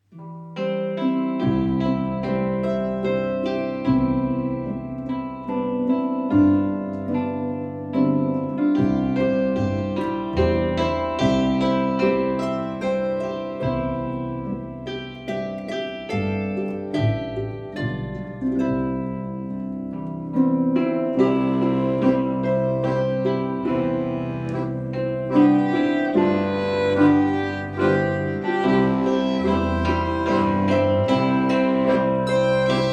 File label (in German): Landler